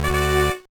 Jingle

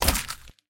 Bee Hive Drop; Lethal Company

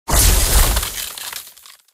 Powerup Freeze Explod

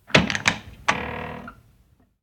Door Open Creak; the 39 steps

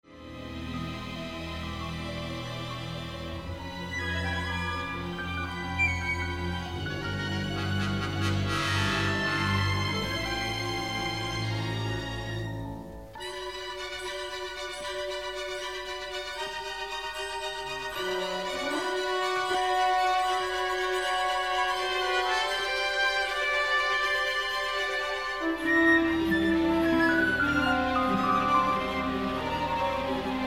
Snow - Ambient; The Ice Planet Hoth

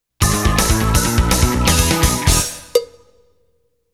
Defeated Funk Defeat Jingle; tokimeki memorial taisen tokkaedama poitter s point

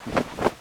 Swish Clothing Rustle

